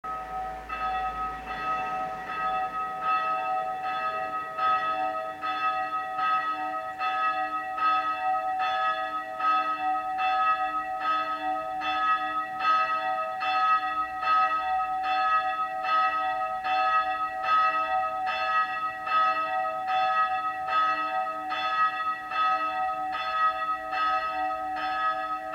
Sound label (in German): Glocke; dom st martin rottenburg